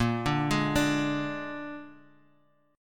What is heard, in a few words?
A sharp 7th A sharp, Guitar Half Step Down